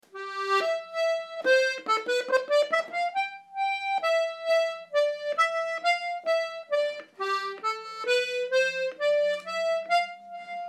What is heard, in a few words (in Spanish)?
Fandango